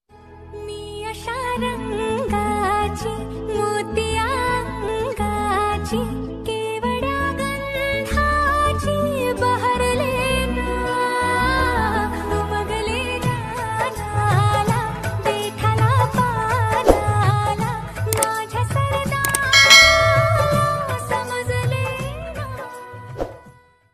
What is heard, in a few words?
marathi song